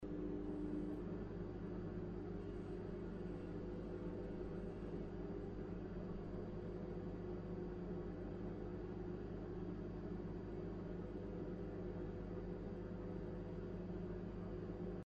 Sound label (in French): micro-onde son